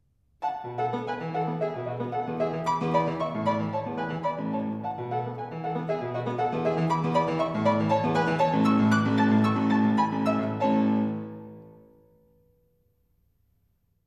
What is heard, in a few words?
Bagatelles for Solo Fortepiano; op 119 no 10; Ludwig van Beethoven